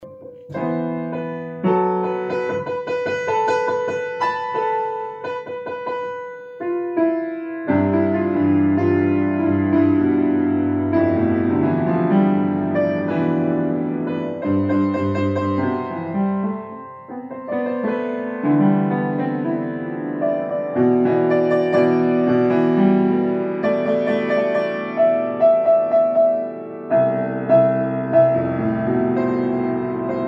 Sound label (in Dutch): improvisaties pedaal